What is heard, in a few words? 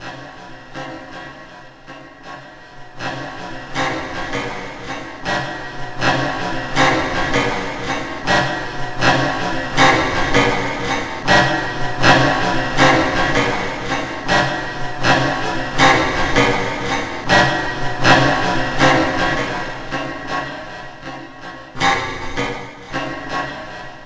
Metal Feedback Groove; Sonic Foundry (Sony Creative Software); Loop Noir